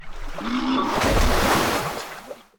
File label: start; Default sound pack extended.